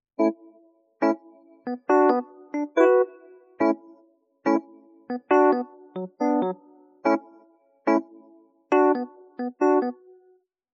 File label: music home epiano